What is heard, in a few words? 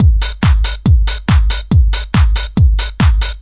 140 909 disco techno, drum loops and breaks